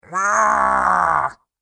Angry Creature Snarls, monster

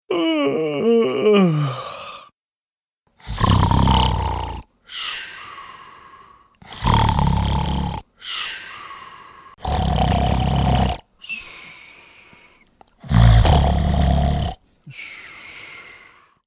Man yawning an falling asleep; Snoring; Human

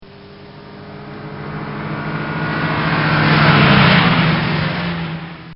Volkswagon Drive By; Transportation; Bobby Cole

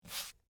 Bible Place Hand, On, Book; Foley; Book Sound Effects; Offices; Blast Wave

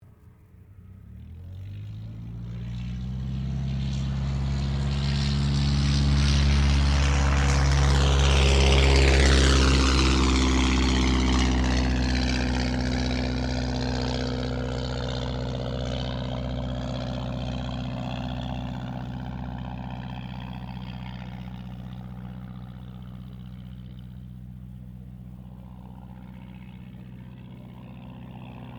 AIRCRAFT DH82A DEHAVILAND TIGERMOTH TOUCH N GO; RAAF Tigermoth; Airplanes; Vehicles

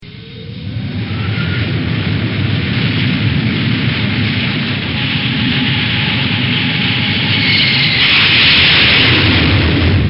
Fa18 Hornet Launch; Bombs; Explosions; Bobby Cole

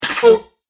Broken Droid Bits, Robot; Robot Sound Effects

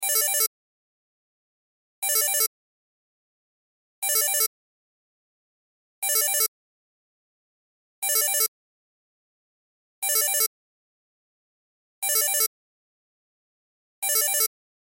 Cell Ringtone; Loop; Cell Phones; Blastwave

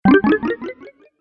Boost Health, video game

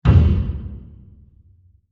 Diffuse Impact Blast; Blasts; Impacts; CraftedSignals

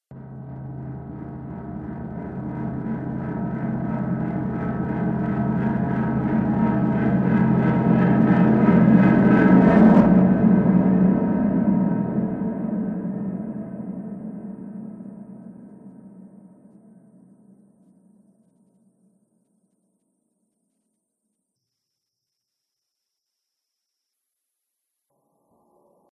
Resonant Reverb Whoosh Sound

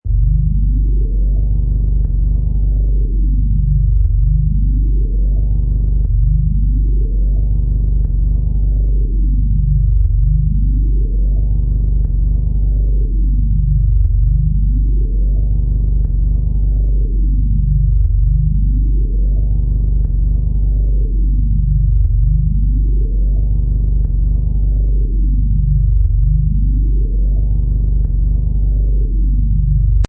Nasuea Bass, Deep and creepy evil bass sound; LOW FREQUENCIES: USE HEADPHONES OR SUBWOOFER TO DEMO; Deep Tones

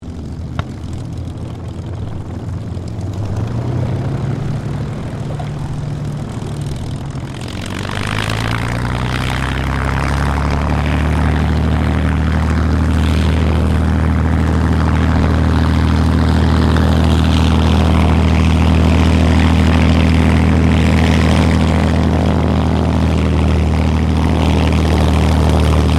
AIRCRAFT CA-18 MUSTANG TAXI SHUTDOWN STEREO; P51 /CAC-18 Mustang; Airplanes

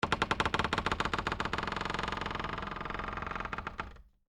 Door Creak Wood, Foley, Door, Creak, Wood; Creaky Doors; BlastWave

